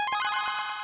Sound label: bonus; pyrogue; Oryx; interface